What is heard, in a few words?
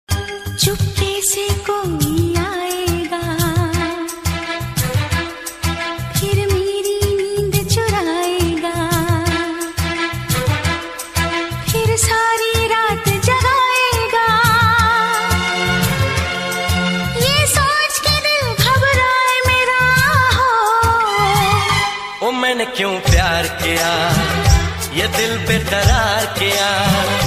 Romantic Hindi Song; ringtones